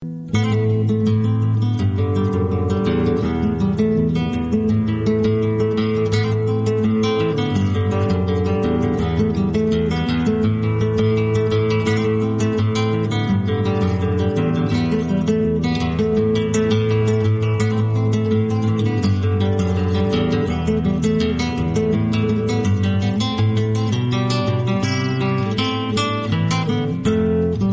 guitar edge ringtone samsung

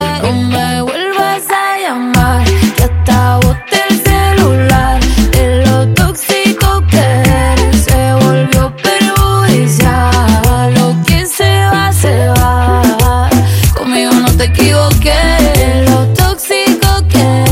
on the drums ringtone; nokia

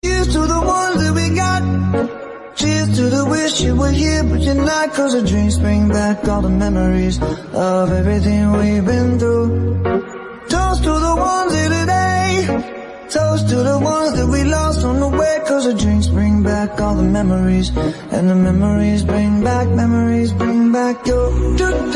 English cute song ringtone

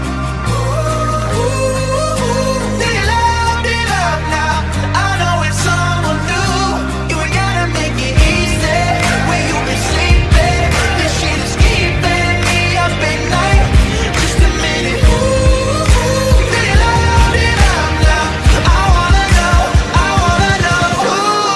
ringtone, english ringtones

